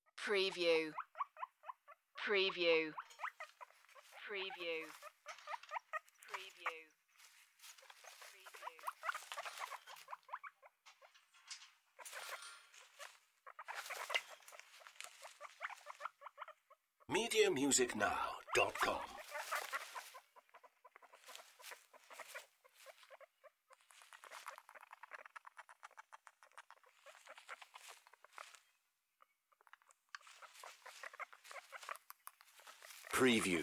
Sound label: Guinea Pig Cage Move; sound-effects-bible